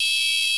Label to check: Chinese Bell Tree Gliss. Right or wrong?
Wrong